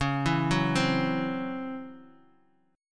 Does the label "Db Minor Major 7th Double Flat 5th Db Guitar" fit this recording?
Yes